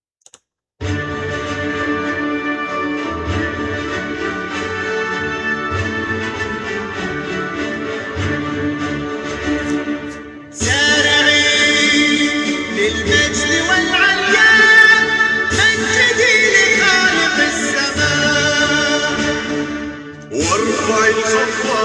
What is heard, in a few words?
russian National Anthem